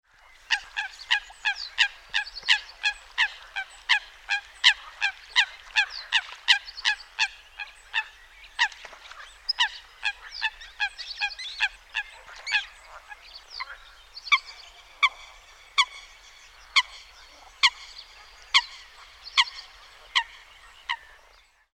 Blaesshuhn Rufe

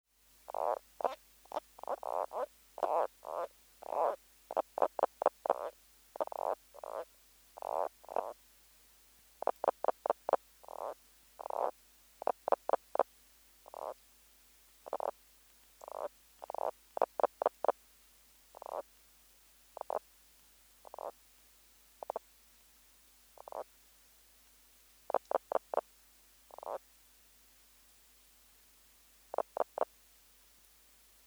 Knoblauchkroete Quietschen und Vertreibung zwei Männchen

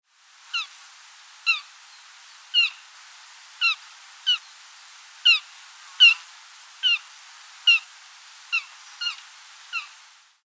Reh Schmalreh Fiepen

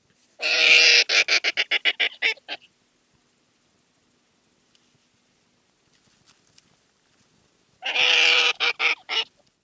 Igel Keckern

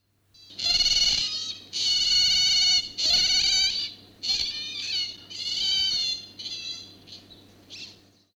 Fischotter Maennchen

